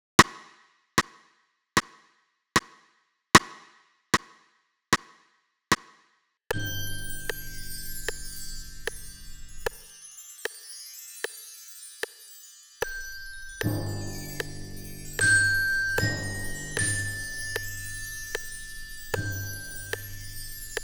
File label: with click